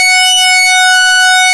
SYNCSWEEP F#, ARP Synths; E-MU Formula 4000 Series Vol. 3; Analog Odyssey; ARP Synths II

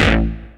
chord 1, sound chord; Ueberschall; Techno Trance Essentials; CHORD2

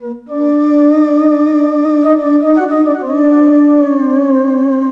SYNTH, ARABIC

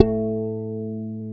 GUI HRMFLA, Acoustic, Roland

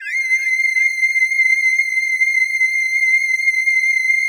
PAD, DIGI-PADS

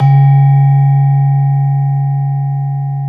SLENTEM, Bronze Gamelan; E-MU Formula 4000 Series Vol. 4; Earth Tones

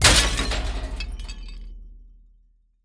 Crash, cartoon Foley Fx; musicradar; cartoon caper

